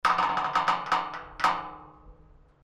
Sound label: gate metal large creak